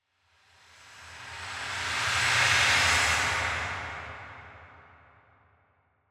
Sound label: Whoosh Metallic Distant Sounding Finale - High; Hollywood Edge; sound designer's toolkit v2